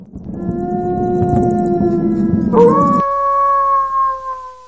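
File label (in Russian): вой волка издалека подхватывает другой волк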